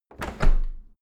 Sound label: window handle close hard; objekte; fenster